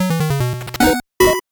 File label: 8bit jingle slow